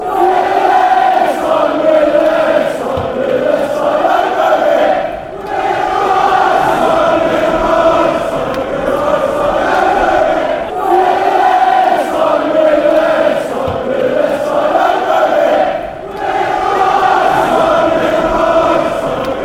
left side right side, chants

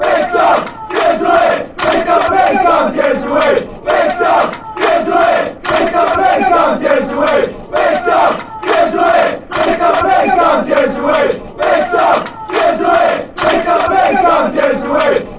big sam give us a wave, fan chants

